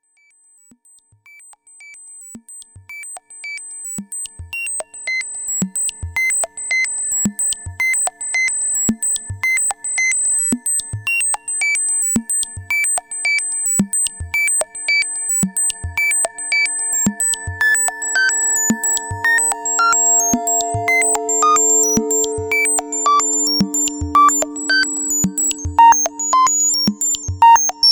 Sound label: ms20 mix